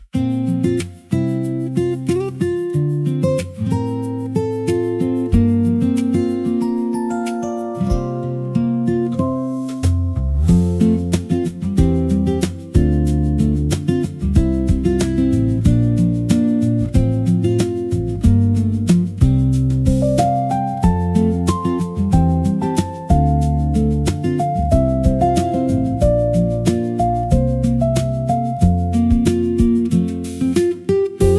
acoustic pop classic slice of life; heartwarming

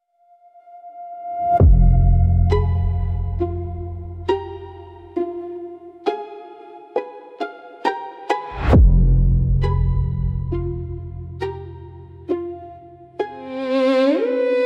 Glass Halo, spiritual spooky soundscapes

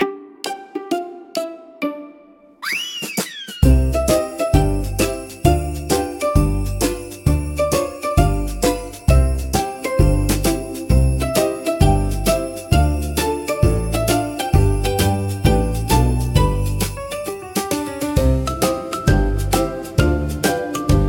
comical marimba for funny scenes

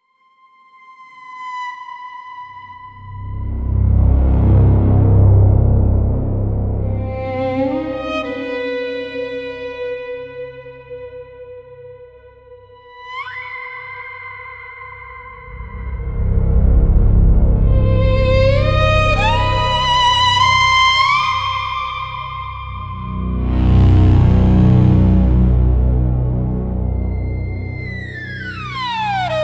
White Hall Screams, spiritual spooky soundscapes

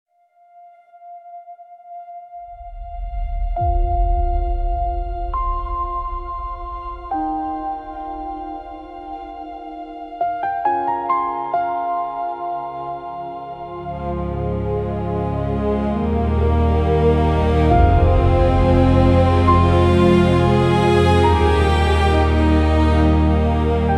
inspiring piano strings for story climax

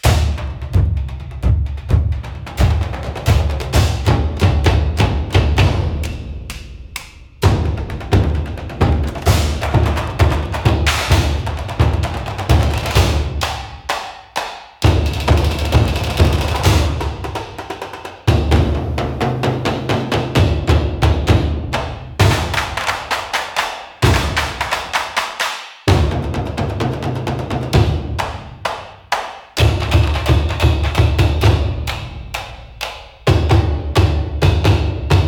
Thunder of Ten Thousand Drums, japanese drums; battle rhythm for power tension battle and heartbeat

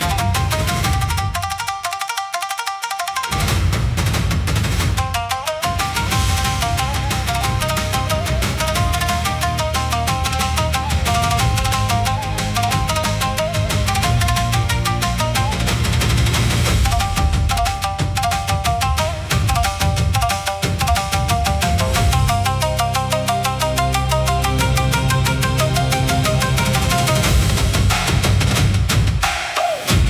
Kensei Thunder, cinematic japanese style battles

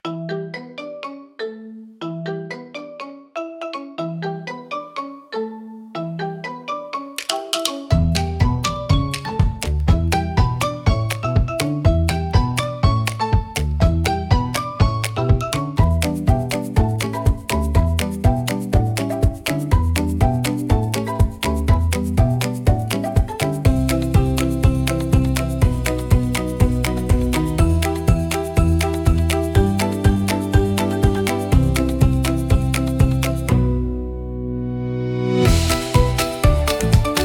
Topsy Marimba, comical marimba

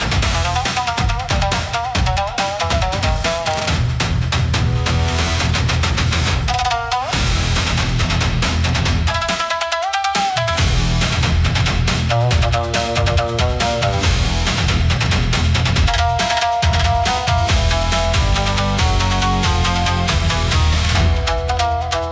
cinematic japanese style battles

